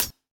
airy hi hat closed sound g key, Royality free hihat tuned to the G note